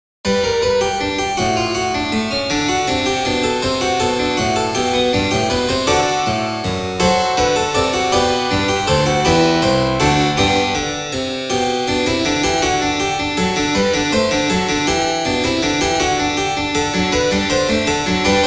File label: organ modified for harpsichord